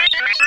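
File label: sphere blips medium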